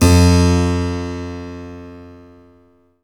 HARPSI F2; HPSCHD 8

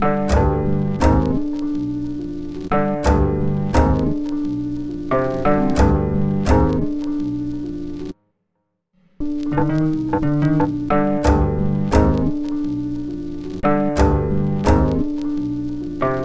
Melody, Hip-Hop

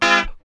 F#3 B funk chord, guitars; Spectrasonics; Hans Zimmer Guitars VOL-1; 90's Sample CDs

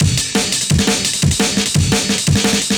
Stomp, m8 breaks

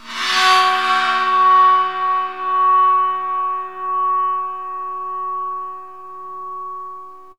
BOW CYMBAL, BOWED CYMBALS; Zero-G Ambient 1

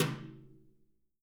BRUSHES, Living Drums

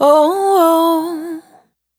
Lateralis, Single Voice Melodic Loops; Singles

